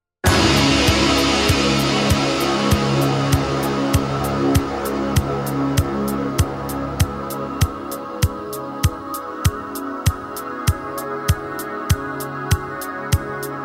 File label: Instrumental; Thunderdome